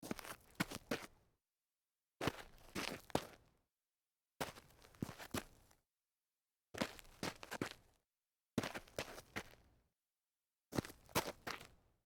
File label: dress shoe turn light grit